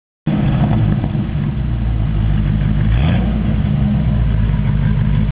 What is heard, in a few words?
exhaust